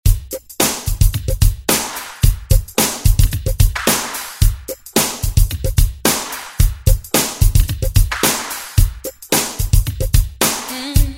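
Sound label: 90s Extended Redrum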